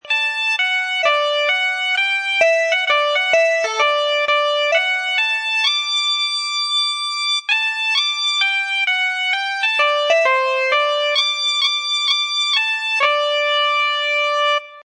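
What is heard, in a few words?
Loop Bagpipes Melody D 130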